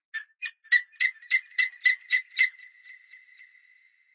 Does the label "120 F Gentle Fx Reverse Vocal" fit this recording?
No